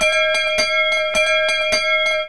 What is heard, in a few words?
bell